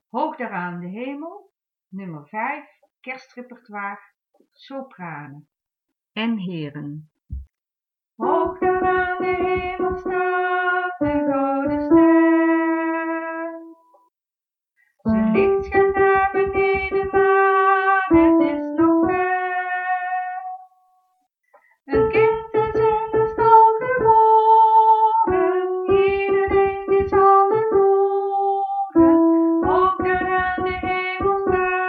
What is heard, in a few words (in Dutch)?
sopranen en heren